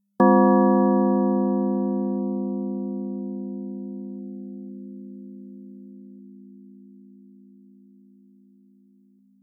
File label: bell